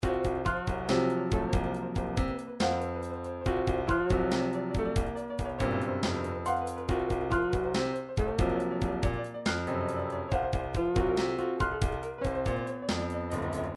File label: triphop; Newtronic Triphop Construction Kit